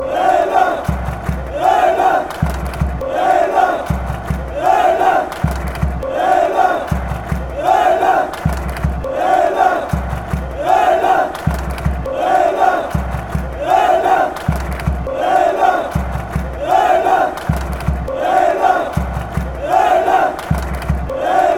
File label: greece chant